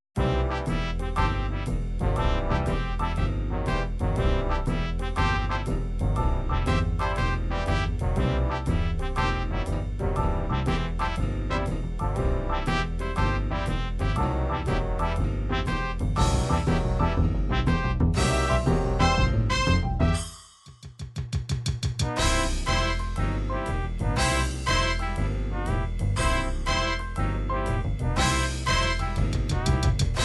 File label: jazz, midi